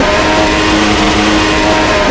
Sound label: Ferrari in C HIGH; AOS F1 2022